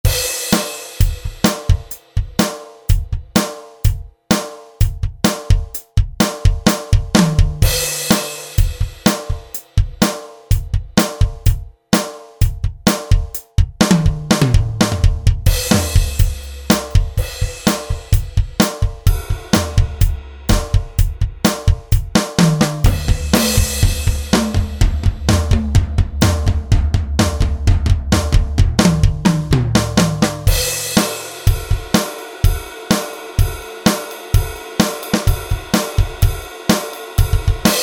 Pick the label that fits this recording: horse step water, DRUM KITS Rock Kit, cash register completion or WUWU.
DRUM KITS Rock Kit